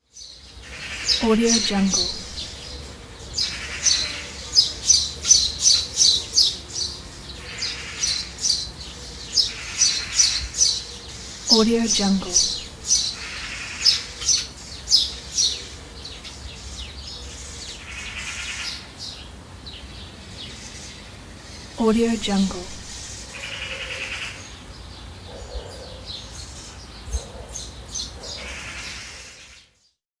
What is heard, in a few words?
birds in the village